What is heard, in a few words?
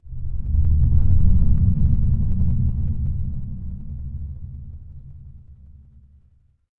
horror ambience dark